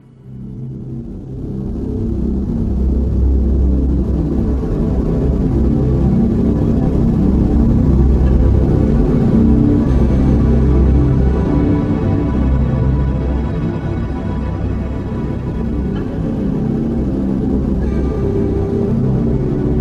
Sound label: Blow Lead